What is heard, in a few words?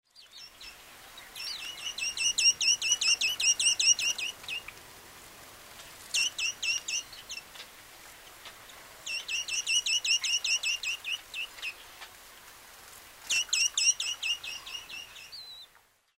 house sparrow